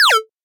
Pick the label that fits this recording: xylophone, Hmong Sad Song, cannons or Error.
Error